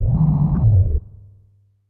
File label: Thunderbolt Rising; Pikachu; Super Smash Bros